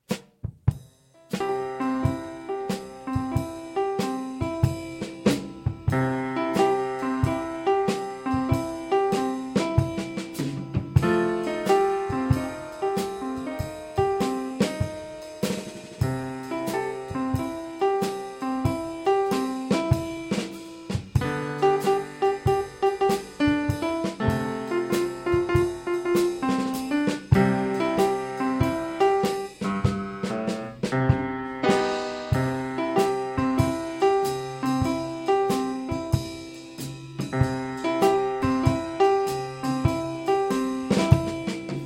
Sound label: blues